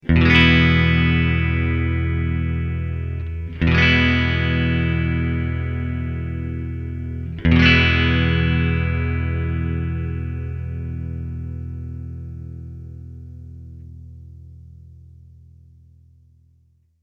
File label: bridge clean; axetec